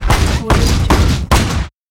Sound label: robot footsteps